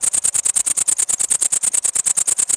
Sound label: crickets; World of Tanks Blitz; pliego